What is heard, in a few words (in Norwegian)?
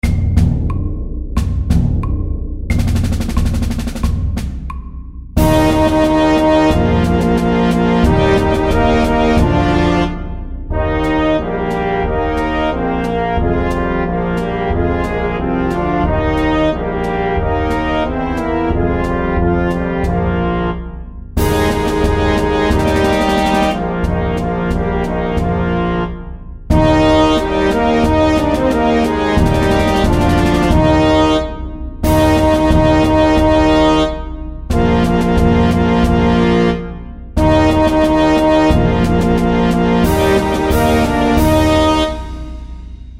Fanfare og marsj SAKTE